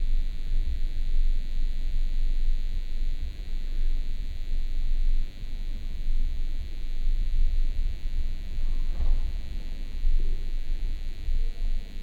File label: buzz